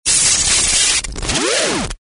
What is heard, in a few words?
comm electrostatic surge